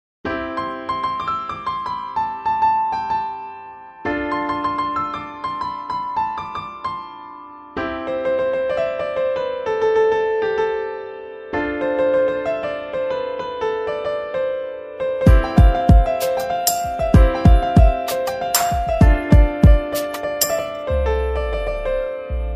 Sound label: piano cover karaoke, marathi songs; fl studio